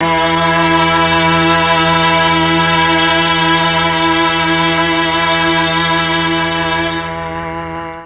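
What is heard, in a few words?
E2 mixed strings, mellotron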